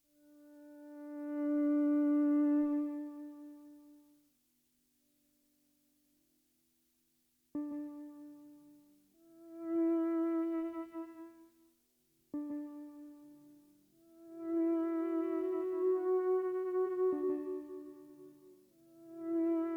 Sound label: Loop III; robson square theatre